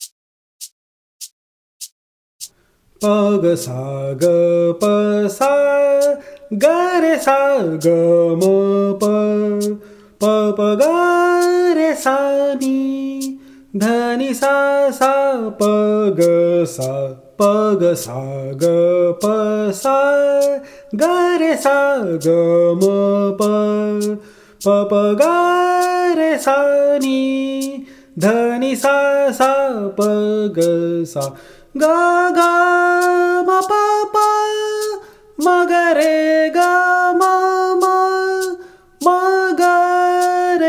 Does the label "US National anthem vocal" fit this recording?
Yes